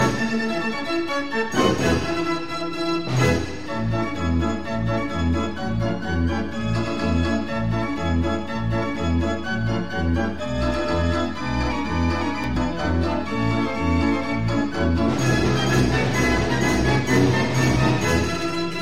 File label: Barrel-organ